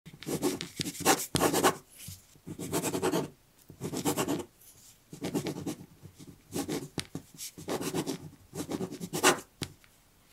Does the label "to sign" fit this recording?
Yes